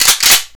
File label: m3 pump, weapons